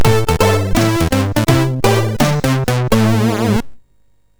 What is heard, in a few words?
Jingles, sid MOS6581R2